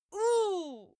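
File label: female pain, screams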